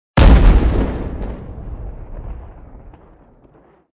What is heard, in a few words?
small blast